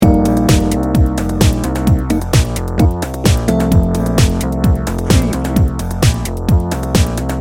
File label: driving innovation loop